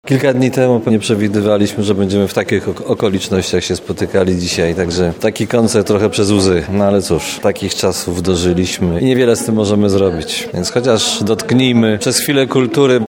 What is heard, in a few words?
piano